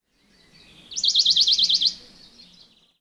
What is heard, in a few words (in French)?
pouillot de bonelli